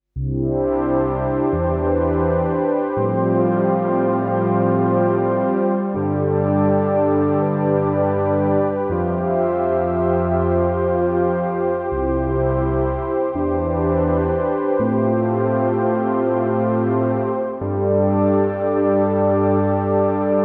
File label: Prophet 10 rev4 Pad vs. Prophet T 8 Ursa Major Space Station